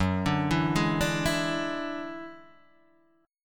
F sharp 7th Suspended 2nd Sharp 5th F sharp down Guitar Open D